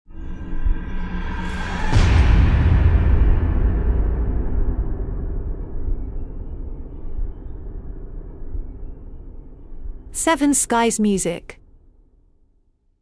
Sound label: Scary sting